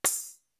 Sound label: R8 PERC; Greytsounds VOL-1